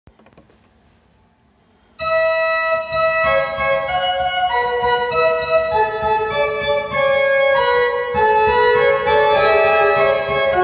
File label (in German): vorspiel fuge a dur; j. pachelbel